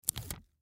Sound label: Bandage Peel Apart, Bandage Peel Apart Package Wrapper; First Aid; Hospital; Emergency